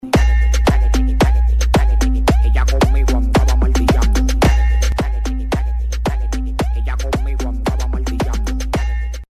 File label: bass